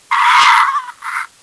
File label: pain; csco; csgo; bot